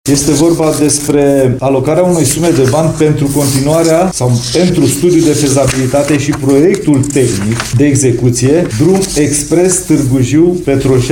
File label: drum; Weber